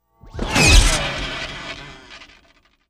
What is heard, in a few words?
Elect Blast Sweeten, arcs & sparks